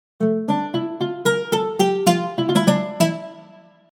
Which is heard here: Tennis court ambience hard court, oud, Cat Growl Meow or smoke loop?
oud